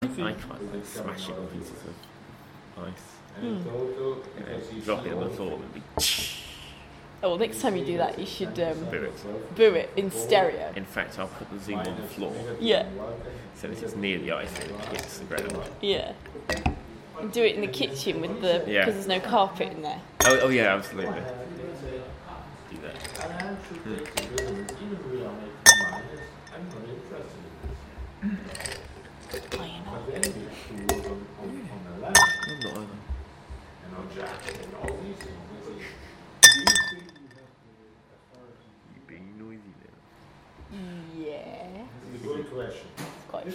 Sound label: more ice in water in hotel bar